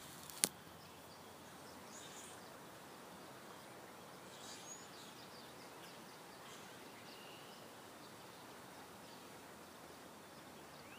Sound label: Birds